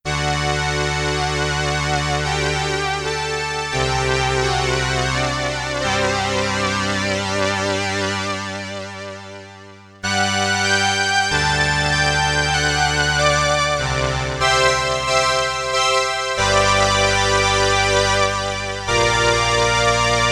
BRASS, STRINGS I, STRINGS II with vibrato, sustain, and Ensemble I, Roland RS-202